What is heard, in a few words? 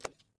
fs concrete, footsteps